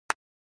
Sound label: clap half sec